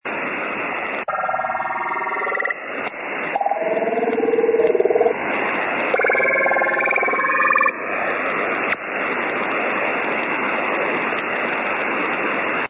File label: drm